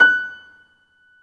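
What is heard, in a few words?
piano F#6, soft piano; AKAI S-Series CD-ROM Sound Library VOL-2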